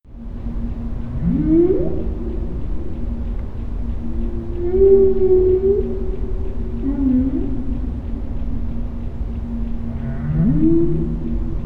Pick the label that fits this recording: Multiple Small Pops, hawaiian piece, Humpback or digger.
Humpback